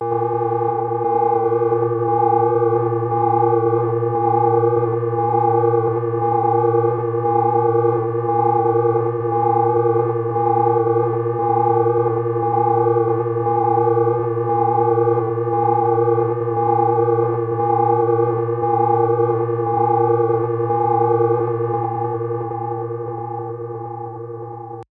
Atmos; neuro; Optiv & BTK

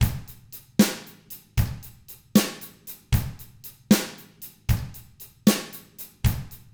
Drums, R N B 6-8; ILIO; Double Platinum Drums 1

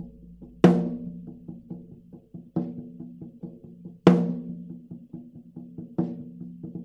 Earth Loop, Drum Tools, Laid Back

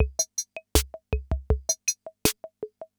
CR78; Best Service; XX Large Most Wanted

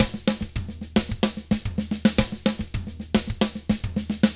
SMBA, 110 SAMBA, Drums

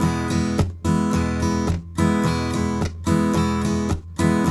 RIFF, Blues Guitar; ILIO; Fretworks

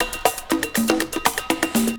120 PERC

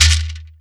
PERC, PERCS KIT

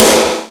KGT1, Korg-T1, Drums

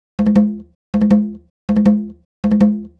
Conga, Latin Percussion

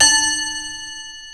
D 3 TOY piano; AKAI S6000 CD-ROM - Volume 1

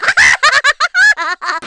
LAUGHING; AKAI S-Series CD-ROM Sound Library VOL-8; SFX

